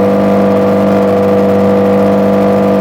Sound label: car engine; Unity; Vehicles